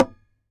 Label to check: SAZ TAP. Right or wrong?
Right